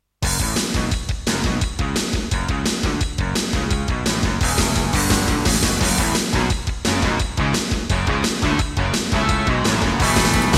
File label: Intro, Roland CM-300; Duke Nukem 3D